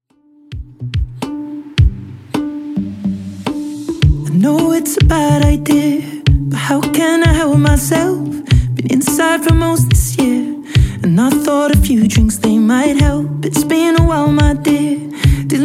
Top40 DJ Friendly Redrum 107 bpm; remixplanet

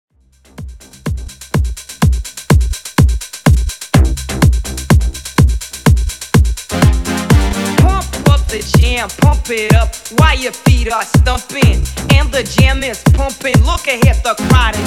125 Bpm; Redrum

